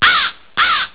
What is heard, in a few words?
crow; Story Maker